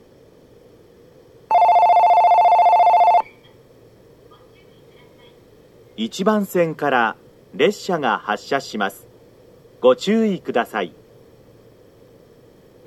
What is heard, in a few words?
kiyosu, station; jr-central